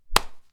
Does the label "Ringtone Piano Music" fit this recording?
No